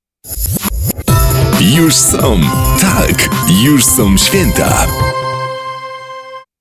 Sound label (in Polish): Jingiel; Juz Sa Swieta